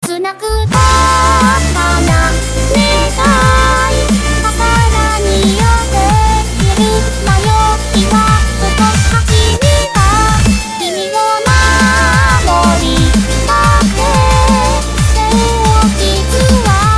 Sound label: Remix